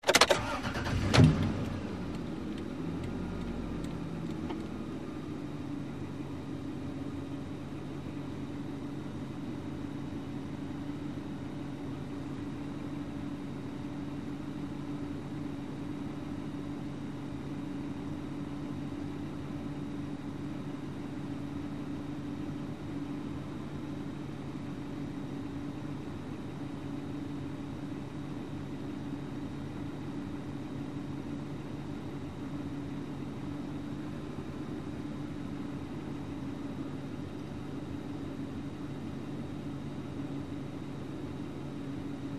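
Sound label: SUV Start Engine Idle Off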